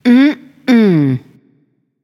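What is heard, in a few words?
disagree, female; smite; voicepack; hera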